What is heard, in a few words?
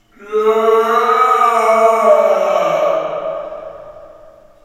prison pain; Quake 4 Alpha; id software